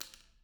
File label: pendrop; IEEE AASP